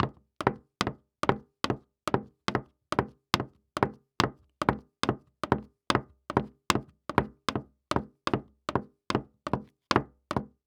Foley Footstep High Heels Hollow Wood Surface Walking; Foley Footsteps